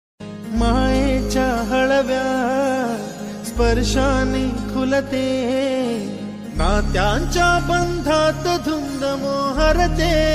marathi ringtone

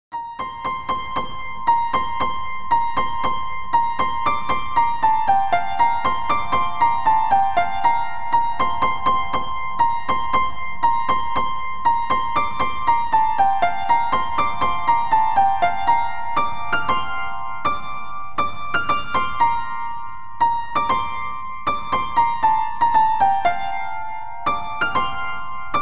piano; varsham

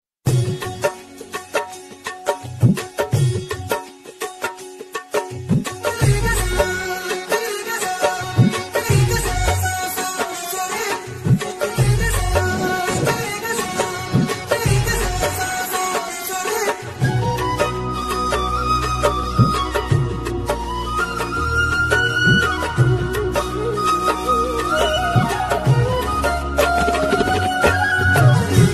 love bgm ringtone tamil ringtones; anjaan

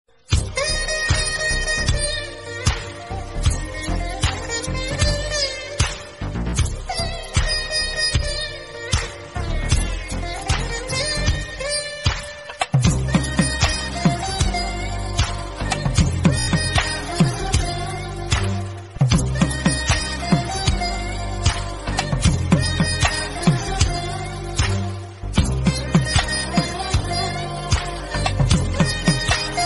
telugu instrumental bgm ringtone; Premikudu

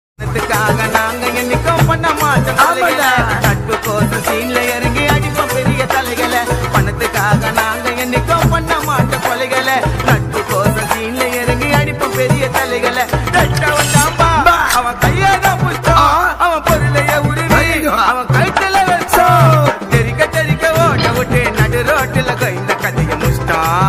rowdy gana song

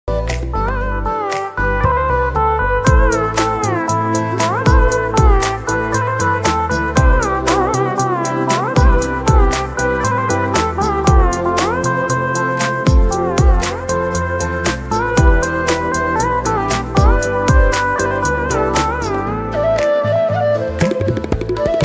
indian remix ringtone english remix ringtone

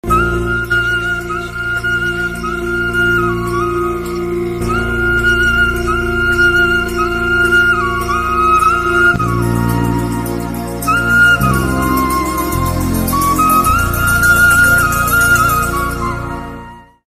heart melting bgm; indian 1 movie

